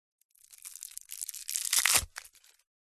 food candy boiled sweet unwrap

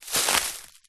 footstep single grass thick dry; foley; zapsplat

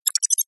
science fiction robotic insect critter chirp; zapsplat

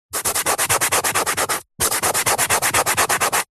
chalk rub erase hand finger chalkboard; office

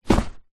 sport exercise mat foam thin rolled up set down

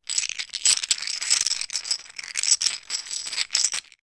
playing discs movement in hand; connect 4

